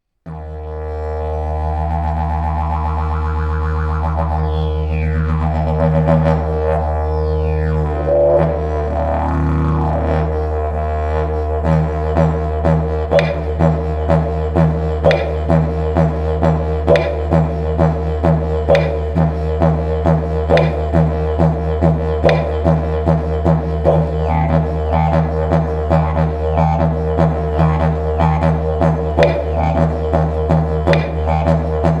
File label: didgeridoo